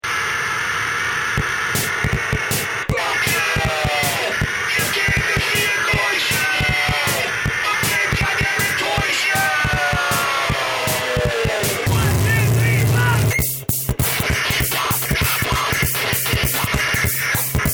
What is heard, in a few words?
karaoke radio; gilligans